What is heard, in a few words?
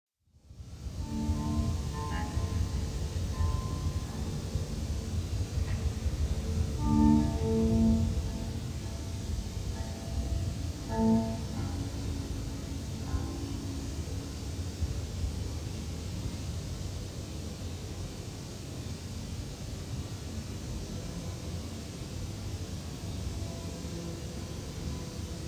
Tuning & Soundcheck